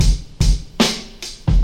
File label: 81 bpm high quality drum loop sample f sharp key